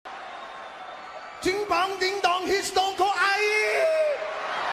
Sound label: ding dong rock